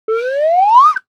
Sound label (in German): Lotosfloete up